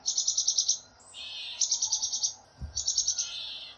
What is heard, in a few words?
Black capped Chickadee